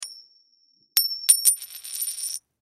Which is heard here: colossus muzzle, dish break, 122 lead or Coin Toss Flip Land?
Coin Toss Flip Land